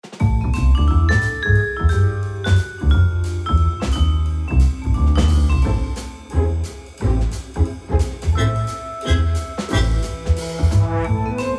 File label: scraptown blues; ritter rost